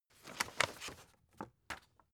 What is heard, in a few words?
old flip page light